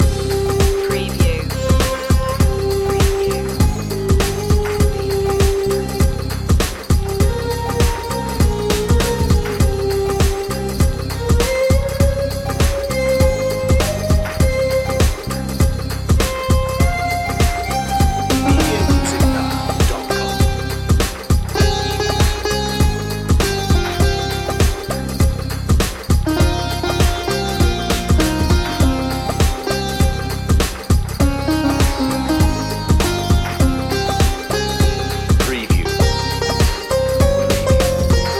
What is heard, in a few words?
bollywood vibes loop